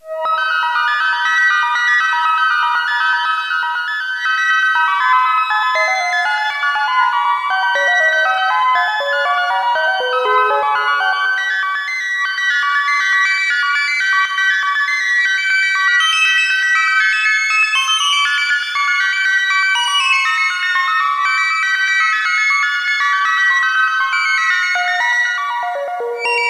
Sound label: flute; bach hunt